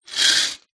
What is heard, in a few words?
leisure toy bingo balls roll in plastic cage short turn; zapsplat